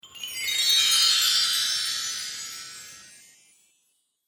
Christmas Spell, Magic Sound Effects

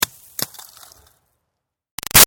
Ice Rock Impact Small Tinkle; Rocks; Materials; Big Room Sound

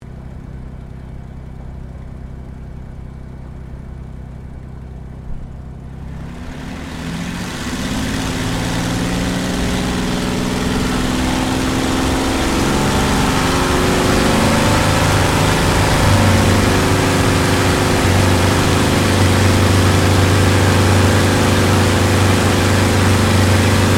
AIRCRAFT CAC CA-18 MUSTANG MK23 TAKEOFF INTERIOR; CA-18 /P-51 Mustang; Airplanes (Sound Effects:Vehicles)

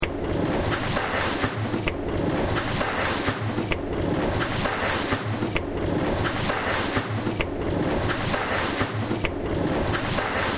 Factory; Factory 12

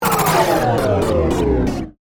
Glitch Descend; Glitch Sound Effects; Sound Design

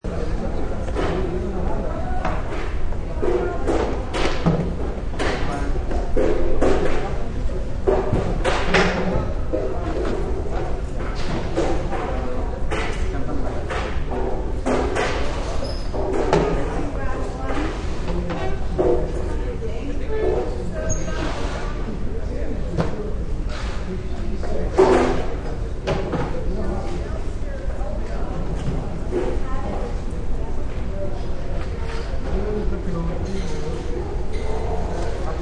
Catering company setting up for banquet.; Caterers Setting Up Chairs; Banquets and Receptions

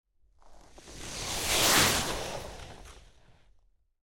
Whoosh Icy By Fast Dry, sound design; Whooshes; Big Room Sound

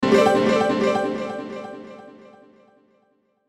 Hit Piano Ascend Delay; Blastwave

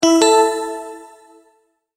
multimedia tone verified

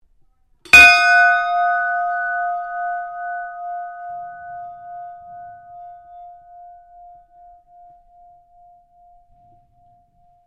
SHIPS BELL RING; Hmas Castlemaine 1942; Various ships bells; Boat Sound Effects; Boats (Sound Effects:Vehicles)

